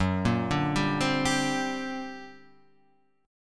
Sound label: G Minor Major 11th, F sharp